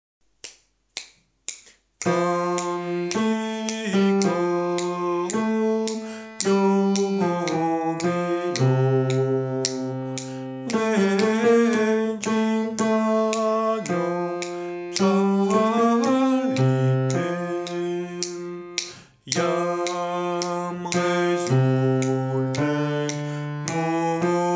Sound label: canticorum basse